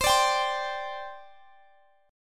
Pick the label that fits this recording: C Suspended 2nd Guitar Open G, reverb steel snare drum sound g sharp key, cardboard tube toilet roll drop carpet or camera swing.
C Suspended 2nd Guitar Open G